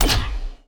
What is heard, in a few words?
magic